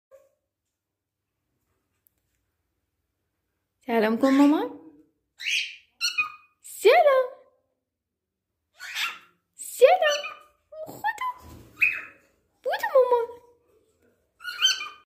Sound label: Cockatiel; ضبط شده سلام برای عروس هلند